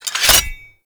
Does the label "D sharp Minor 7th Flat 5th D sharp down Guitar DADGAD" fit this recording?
No